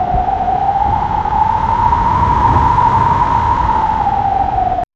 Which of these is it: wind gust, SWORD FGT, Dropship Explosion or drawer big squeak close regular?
wind gust